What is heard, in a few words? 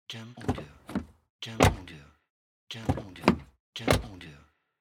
Car Doors